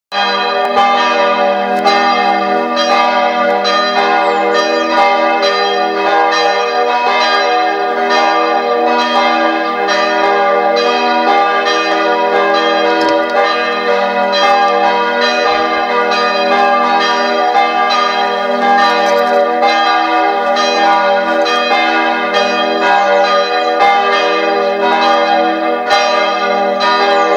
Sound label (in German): Glocken